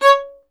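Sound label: STR violin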